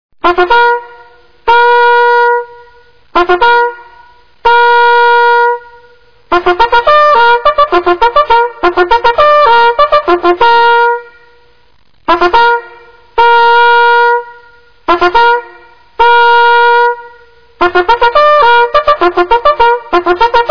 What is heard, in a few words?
school, Bugle calls; American Civil War